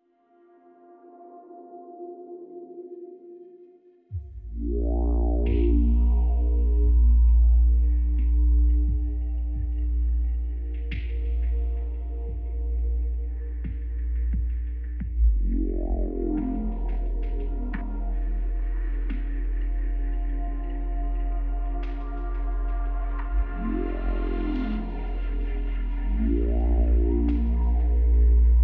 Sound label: Dub